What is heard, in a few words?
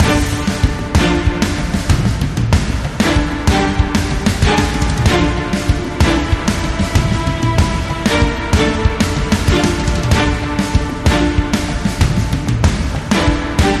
Epic Action Orchestrations